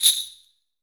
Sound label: SUZU